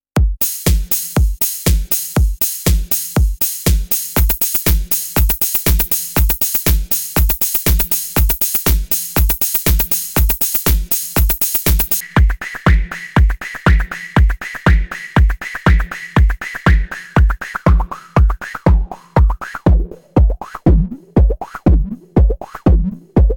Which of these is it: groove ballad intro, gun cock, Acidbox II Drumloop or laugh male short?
Acidbox II Drumloop